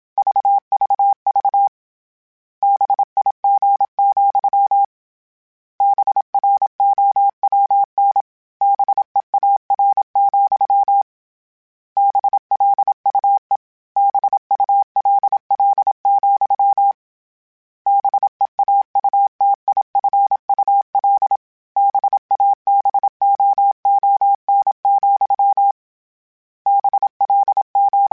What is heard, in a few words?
Morse Code, CW Code Groups; Mixed